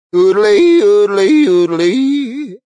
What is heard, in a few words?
Yodelling, Male, Yodeling, singing, vocalization, song, (human voice); Sound Effects:Voice; VoiceBox